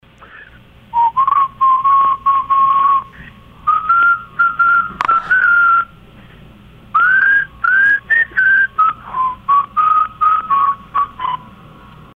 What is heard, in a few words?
whistle